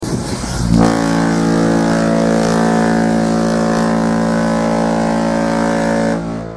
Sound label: horn; queen mary 2